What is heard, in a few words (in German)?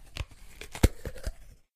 vital isst; minecraft